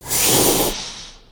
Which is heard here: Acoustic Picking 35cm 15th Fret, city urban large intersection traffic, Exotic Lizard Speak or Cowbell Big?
Exotic Lizard Speak